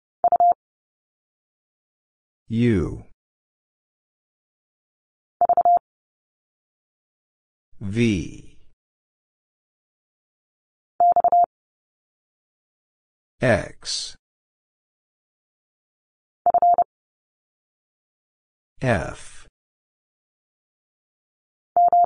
Letter x Single Character 30wpm, morsecode; Lesson 37c

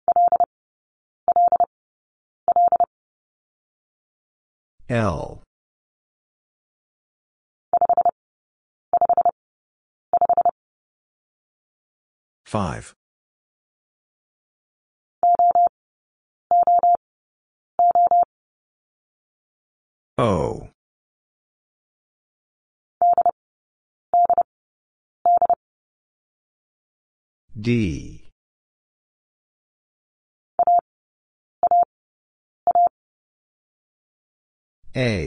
Number 5 - Single Character Repeated 3x 30wpm; Lesson 15b

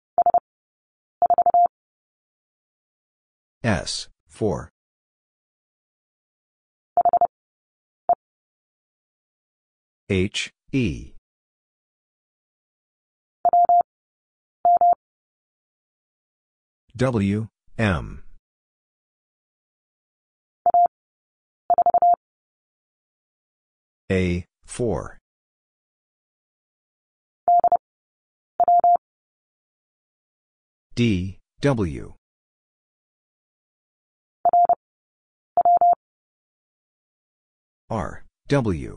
Letter w - Two Characters 30wpm, morsecode

